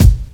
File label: acoustic kick drum B key; kick drum samples